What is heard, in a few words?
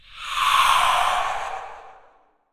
Spirit Underlay Play